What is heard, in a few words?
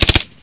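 smg1 suppressed 3 rounds; weapons; hl2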